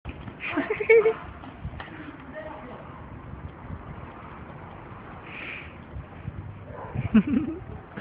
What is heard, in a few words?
hihihi